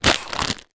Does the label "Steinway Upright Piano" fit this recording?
No